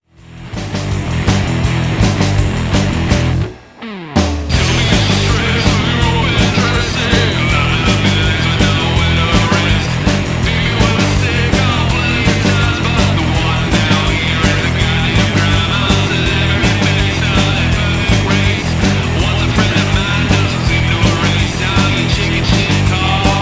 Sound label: Wrecked Chords